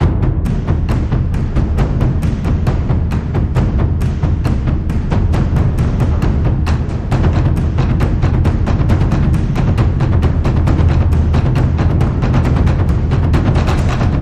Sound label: Drums, Action Loop